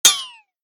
Cartoon accent funny impact

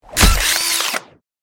Robot punch impact; robotic; gfxsounds

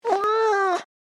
Stressed cat wail; animal

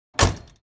Car door close exterior

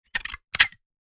Big rusty scissors cut snap

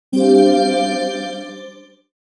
Level up game

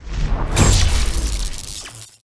Super power speed approach

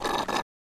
Person drinking through straw slurp

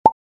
Bottle cork pop

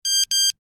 SMS notification beeps message smartphone

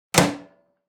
Microwave door close; appliances

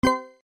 User interface confirm beep

